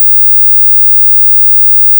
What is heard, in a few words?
triangle 20000 mono